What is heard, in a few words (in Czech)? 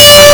kytara 580Hz; KMITÁNÍ A VLNĚNÍ; hudebni nastroje; CHORDOFONY